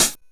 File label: Drums, Hits; DCM8